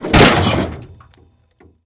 snowmobile suspension heavy

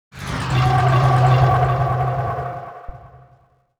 roar

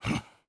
swing release

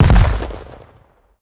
expl close, explosion; World of Tanks Blitz

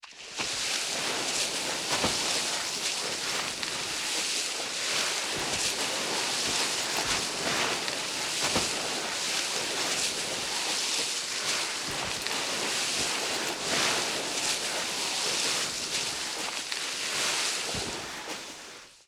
drag to car; Modern Warfare

